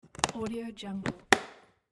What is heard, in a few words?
toolbox latch closed